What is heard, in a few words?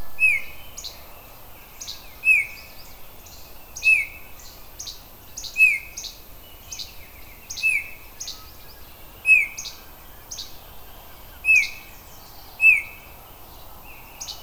oriole; Oriole 2009, version 1